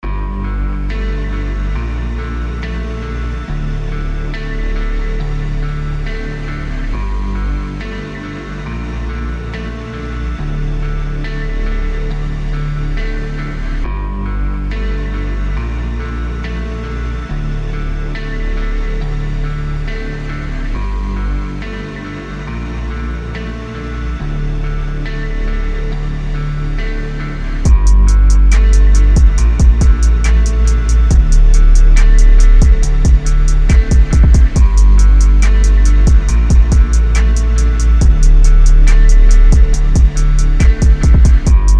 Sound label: BASS BOOSTED